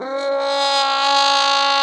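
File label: Plunger Mute, Brass; Roland L-CD702; Plunger & Wa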